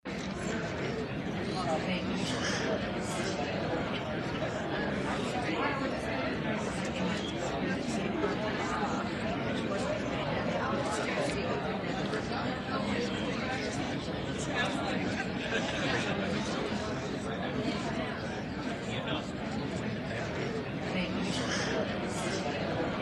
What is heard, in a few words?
Party Chatter